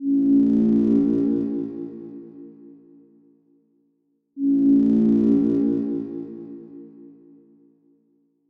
DRONE music

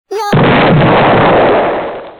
pumpkin voiceclip "YOUR" explosion

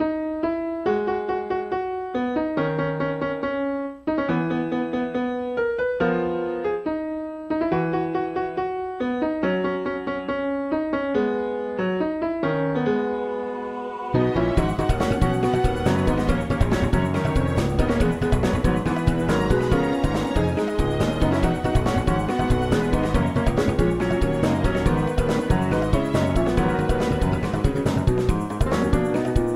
Main Theme Piano Sketch music

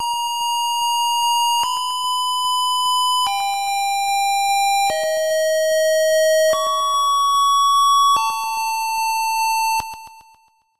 get key music

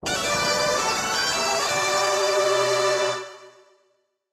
dark fountain music

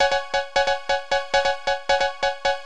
Newscast music

